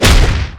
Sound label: drop